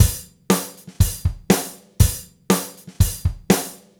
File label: Gretsch groove 120 BPM, 1967 Gretsch Kit drums